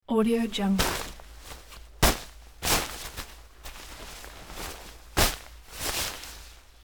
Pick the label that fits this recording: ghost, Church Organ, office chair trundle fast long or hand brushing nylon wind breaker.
hand brushing nylon wind breaker